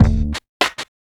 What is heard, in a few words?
Urban Beat, Drums